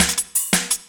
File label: LOOP SD; Ueberschall; Drum & Bass Resonance